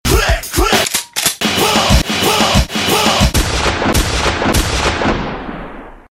Shotgun Barrage

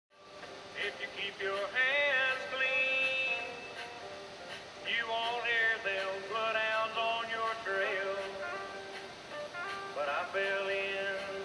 Mud in water

